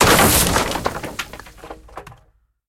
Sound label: Destroy Corridor; Game rip; MiSide